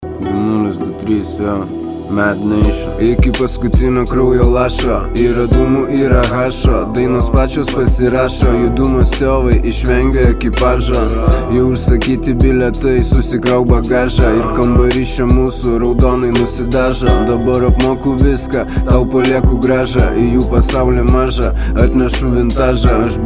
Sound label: UNMIXED Hip Hop; RSD Studios